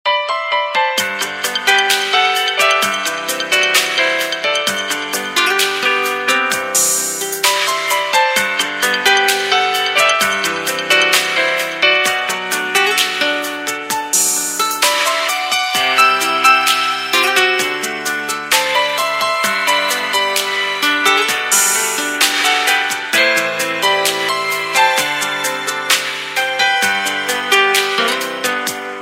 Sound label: peaceful music